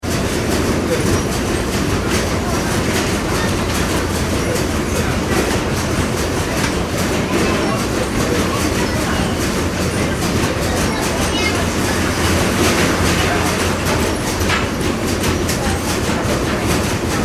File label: ambience airport la rochelle baggage reclaim; Baggage Reclaim ( Small Room ) - La Rochelle Airport; McKinney Sound